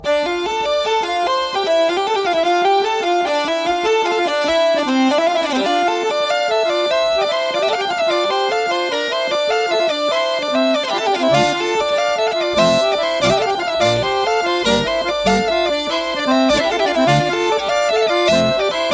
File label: Polka Ska